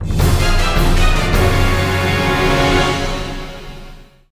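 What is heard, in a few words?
Jingle LOSE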